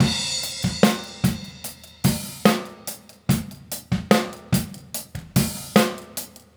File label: Straight Kit, World Pop Beats